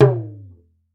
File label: African Drums; DON-DON HI; E-MU Formula 4000 Series Vol. 4 – Earth Tones